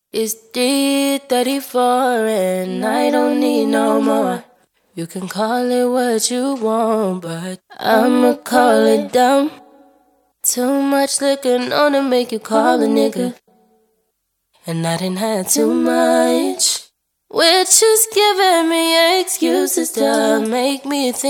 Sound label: Acapella